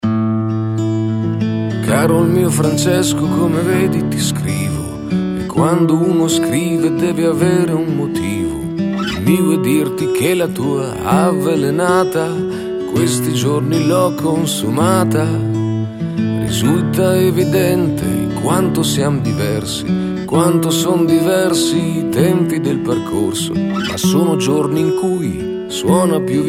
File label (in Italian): in acustico